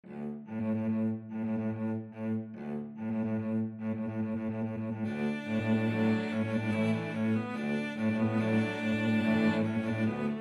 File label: cello quartet